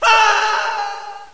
scream; sc persia

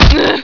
spank orgasm

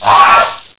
pit worm angry

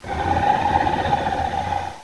gonome idle

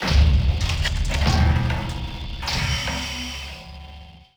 machine

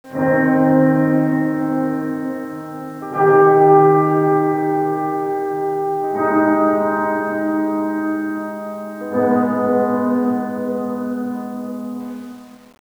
ghost piano

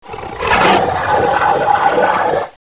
gonome melee

